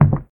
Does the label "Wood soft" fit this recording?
Yes